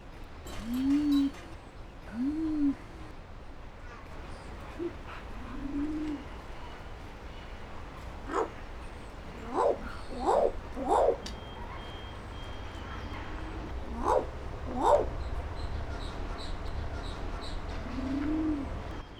Ducula concinna

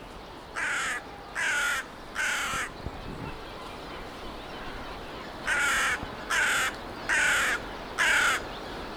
Corvus cornix croak

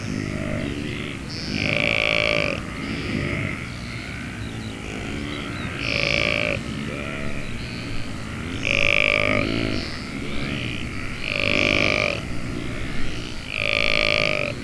Saiga tatarica saiga herd; At dusk, the vocalizing increases, reminding the frog chorus

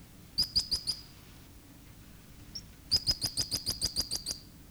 Spermophilus pygmaeus alarm

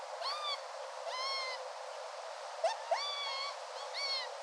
Speothos venaticus whine

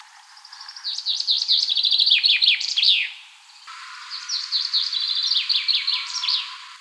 Fringilla coelebs two males

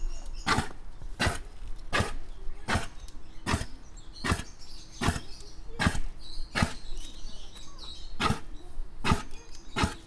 Rangifer tarandus female

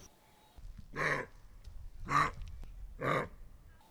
Capreolus capreolus alarm

